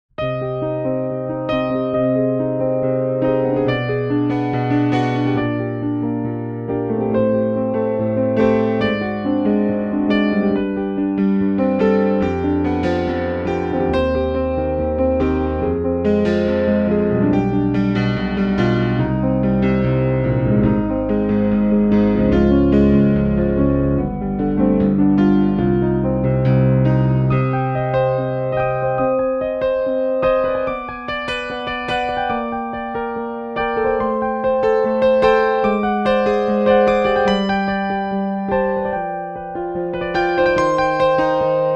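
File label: cp80 authentic, Pianoteq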